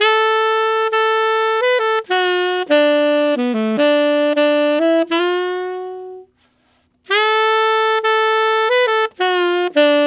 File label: Tenor Country in D maj, Tenor Sax Loops; Sonic Foundry (Sony Creative Software); Jazz Solos and Sections